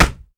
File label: body impact fists; physics